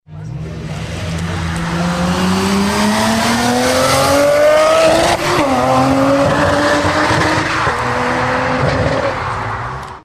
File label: engine car sounds nissan skyline